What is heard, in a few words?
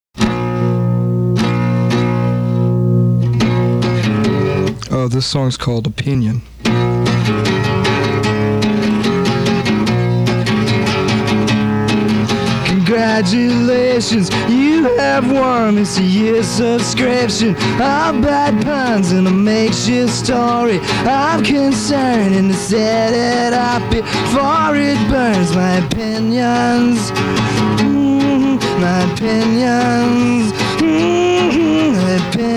(Solo Acoustic Radio Appearance)